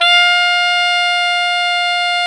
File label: F high, alto sax; Giga Samples Collection; Brass